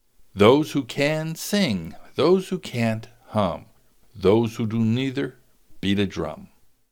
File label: music